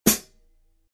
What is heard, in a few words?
15in New Beat HiHats, cymbal sound